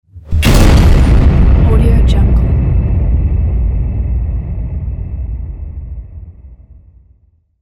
explosives; fx-channel

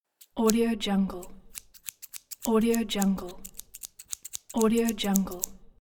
scissors; dragospitaru

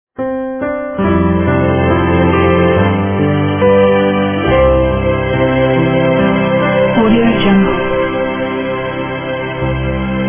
elegant modern logo

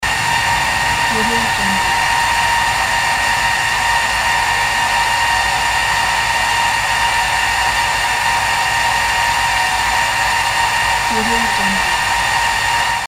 petroleum plant

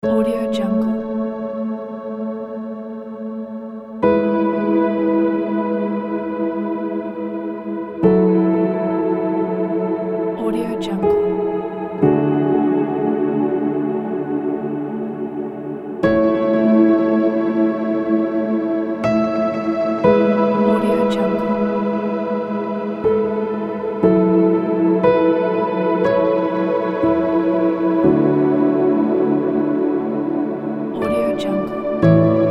floating piano

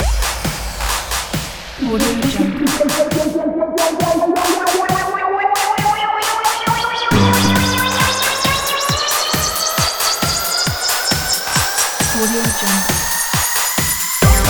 dance party

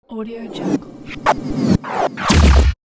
laser needle

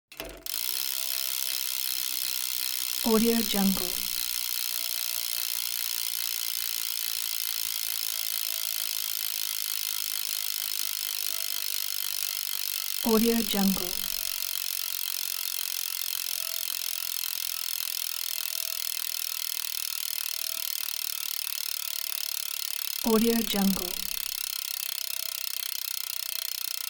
sport bmx bike freewheel coast

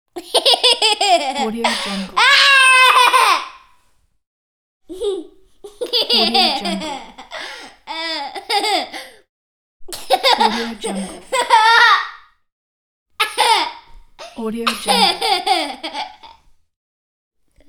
laughing kid

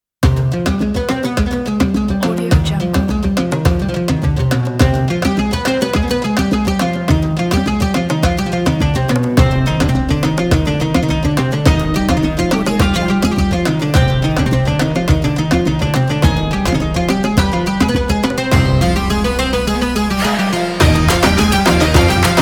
ramadan

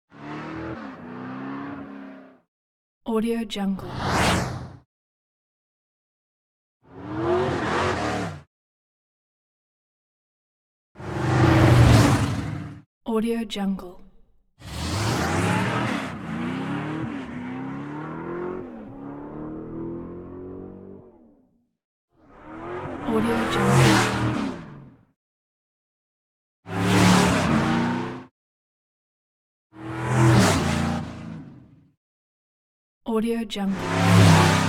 hot rod fast maneuvers; prosoundfx

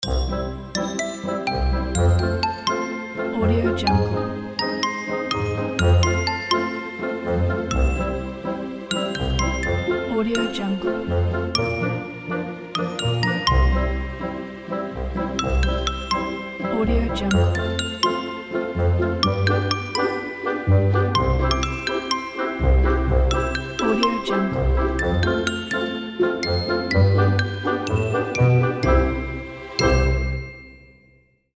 funny kids ident

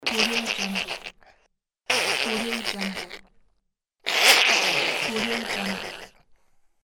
spitting duck laughter; fxprosound

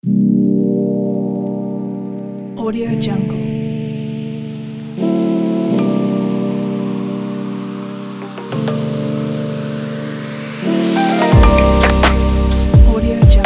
the lofi

